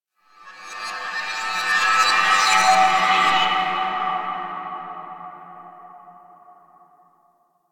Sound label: Undercroft Rev Delay Warp Space One Off, Ambience; Warframe; Duviri